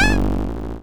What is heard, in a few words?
teleport